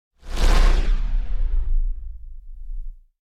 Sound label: Jet Phased Whoosh - Flat; Hollywood Edge; sound designer's toolkit v2